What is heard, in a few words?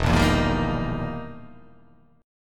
E Minor Major 7th Flat 5th